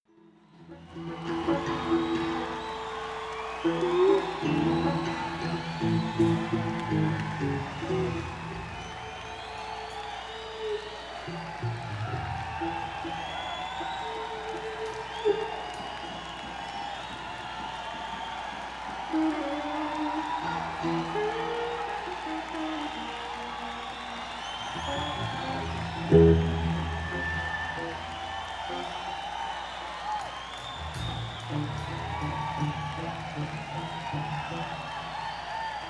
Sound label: Tuning